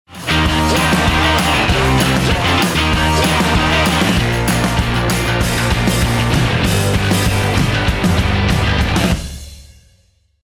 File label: Music